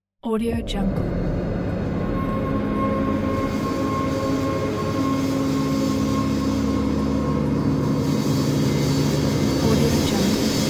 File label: hellish drone